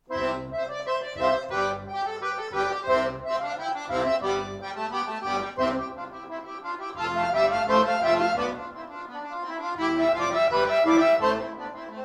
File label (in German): Concerto C Dur